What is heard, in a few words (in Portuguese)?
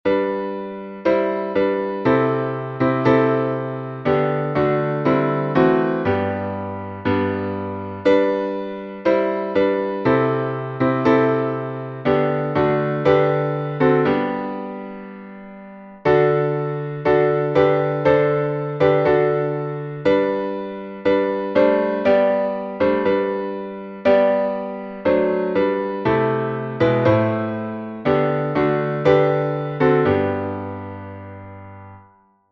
salmo playback